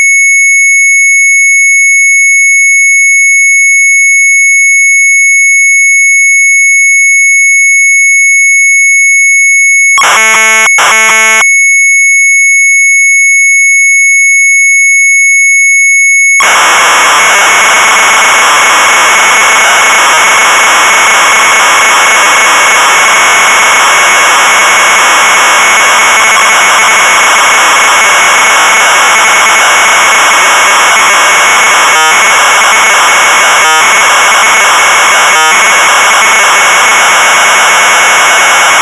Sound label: MZ800 Tape; ENGELSE WOORDEN; retromelsarchive; sharp mz800